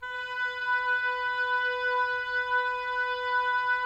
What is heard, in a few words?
OBOES B; Miroslav Vitous